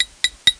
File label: Photo Tap; NIV Bible, Billy Graham's Steps to Peace with God, WWJD Screensaver